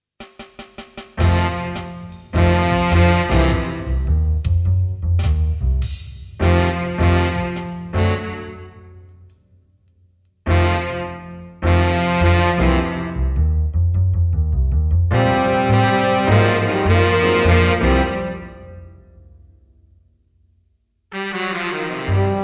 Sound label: minus drums